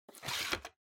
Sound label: Time Card Rack Put In